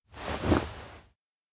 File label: whisper gesture; koyomi; anemoi パッケージ版